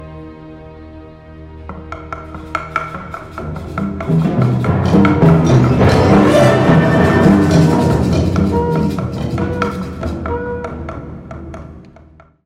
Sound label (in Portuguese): cuica e roncador